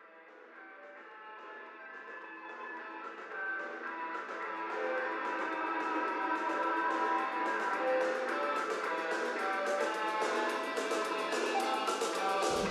панк phonk

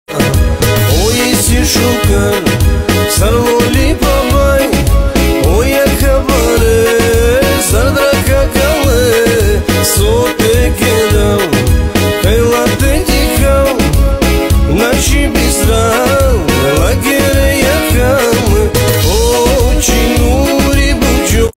цыганская песня

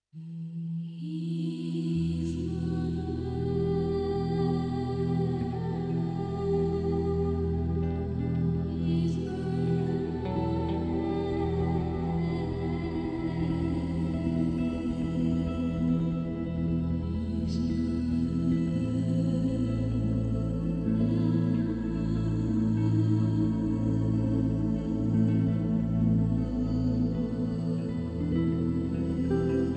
восточные музыка instrumental красивая мелодия

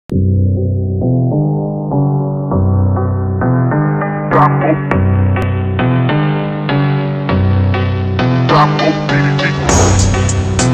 мощный phonk фонк бит

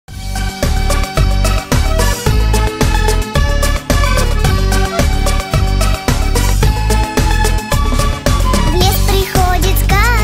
песня speed up; маша и медведь

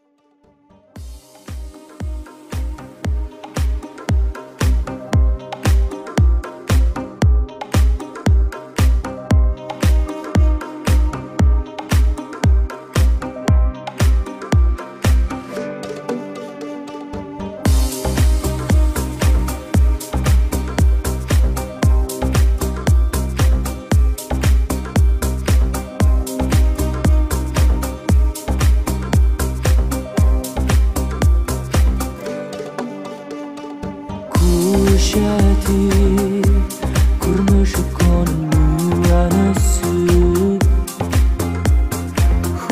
cover очень красивая албанская песня в два голоса

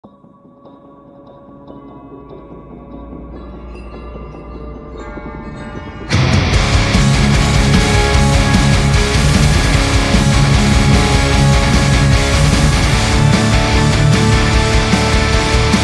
ai cover эпичный power metal

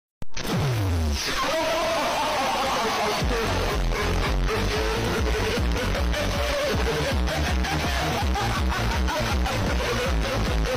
вентилятор мем

